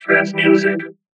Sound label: Trance music